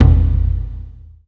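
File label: Malaysian PERCussion E1; USB Soundscan vol.60; Asian Traditions; AKAI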